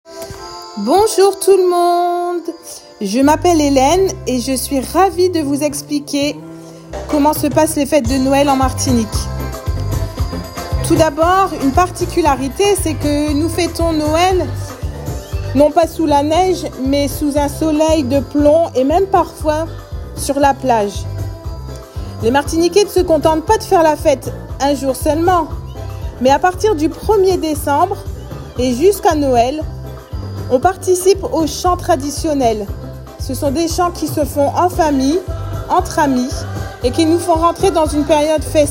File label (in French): Noel en Martinique